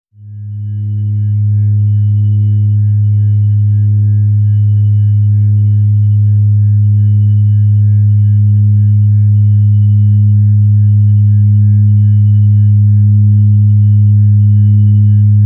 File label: organ tone g sharp